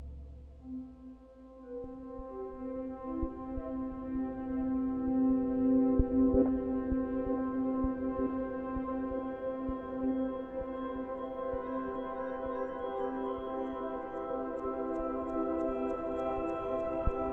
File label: Harmonic Melodic; TU Wien